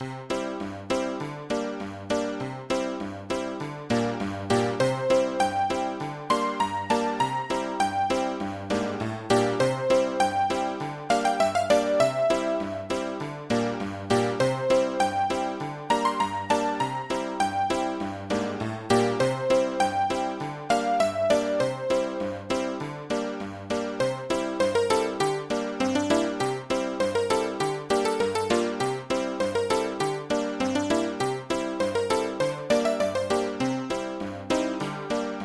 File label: Honkytonky Newbie Melody; RuneScape